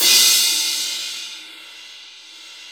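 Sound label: cymbal crash; Best Service Gigapack 2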